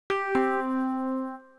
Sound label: Report Delay, phonetones; Alcatel OT 756 PC Suite